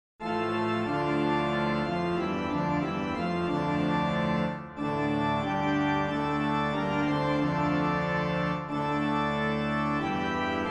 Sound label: Organ